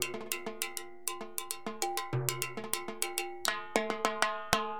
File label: AcidJazz Funk Perc; LiveAct Pool